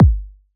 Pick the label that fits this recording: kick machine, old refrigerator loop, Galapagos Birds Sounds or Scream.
kick machine